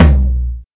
TOM, Jungle; Gorge Sample Pack Vol.1; San Gabriel

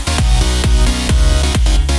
ahaha

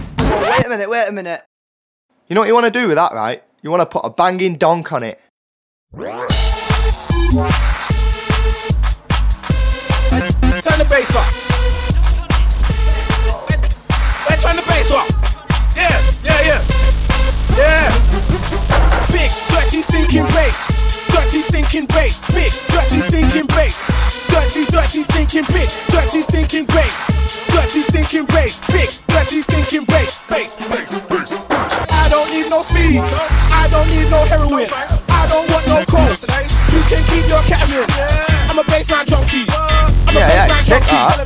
Bassline Donk

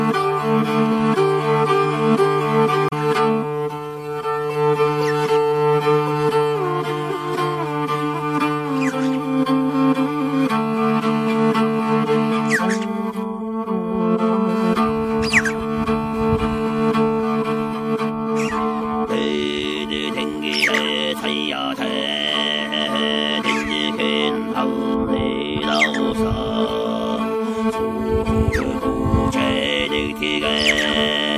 Mongolian Throat Singing

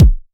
kick; Rialasten 2022 Xmas Sample Pack; 2022 Xmas Packs

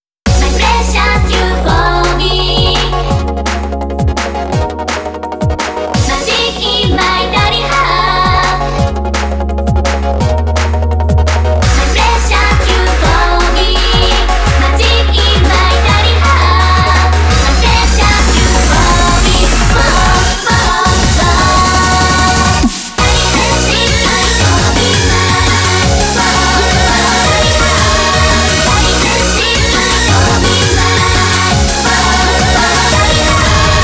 Drum n Drive Remix; u-z Remix XmasPack2023

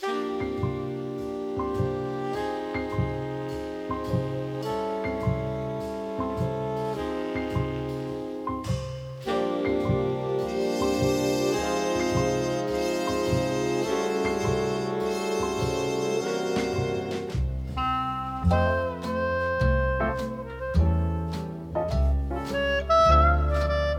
Gentle Forest Jazz

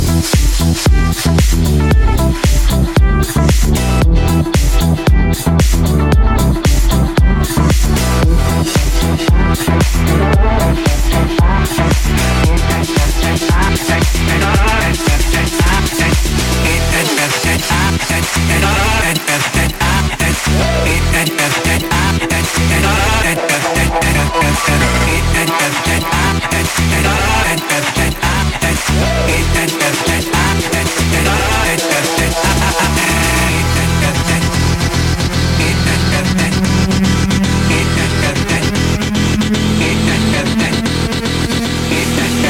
funk

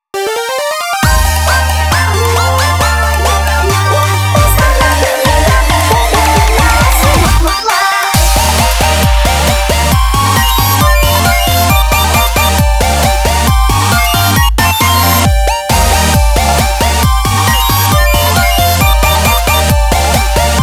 8-bit EDM Remix; u-z Remix XmasPack2023

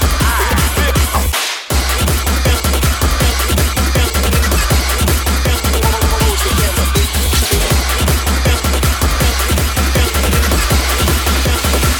Hyper Bass

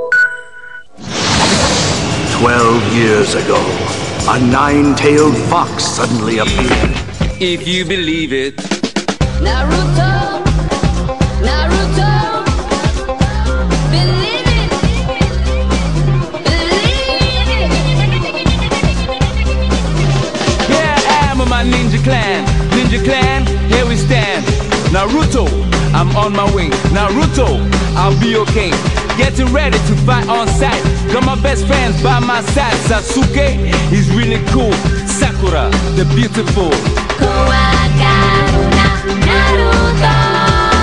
german haha

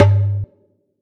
TOM, Jungle; Gorge Sample Pack Vol.1; San Gabriel

